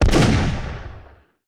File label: Pistol Shot; Period Combat